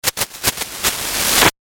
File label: White Noise Percussive Sweep; Whoosh Sound Effects